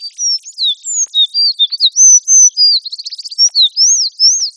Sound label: wren